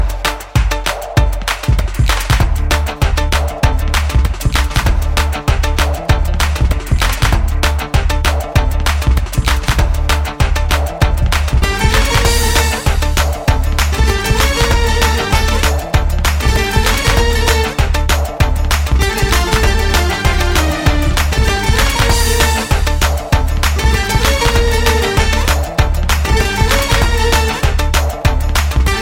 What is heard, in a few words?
instrumental